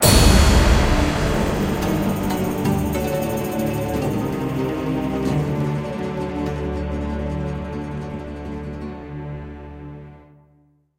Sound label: Stinger; Sam & Max The Devil's Playhouse; Tomb of Sammun-Mak